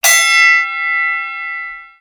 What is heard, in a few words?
bell fight ring